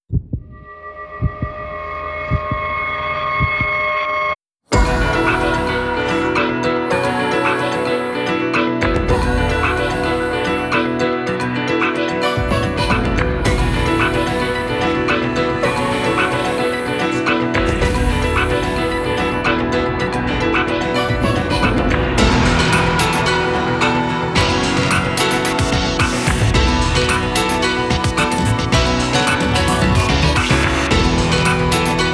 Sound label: Rustling Throb cloche; Ar tonelico II Melody of Metafalica